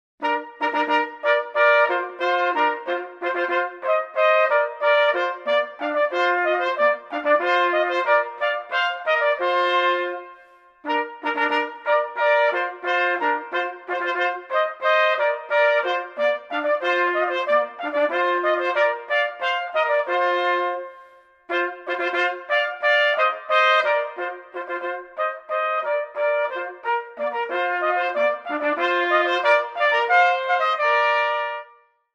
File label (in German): Aufzugsmusik